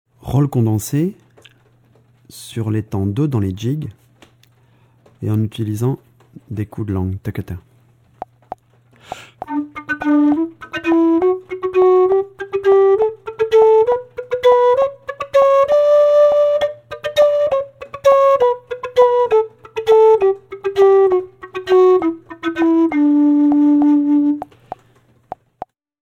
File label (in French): roll condense jig, irish flute